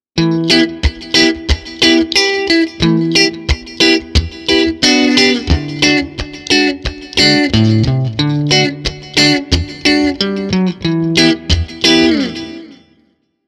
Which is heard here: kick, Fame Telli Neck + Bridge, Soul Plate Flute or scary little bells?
Fame Telli Neck + Bridge